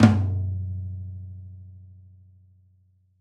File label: Folk Flam Tom